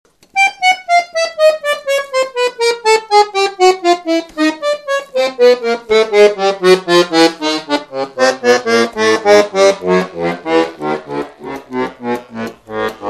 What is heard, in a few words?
Giulietti F4T FB Chromatic Scale, accordion